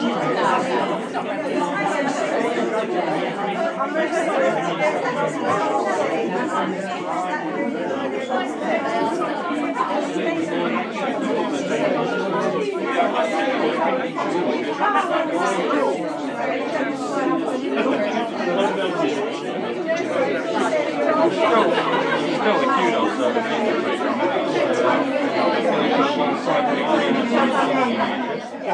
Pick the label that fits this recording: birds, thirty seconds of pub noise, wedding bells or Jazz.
thirty seconds of pub noise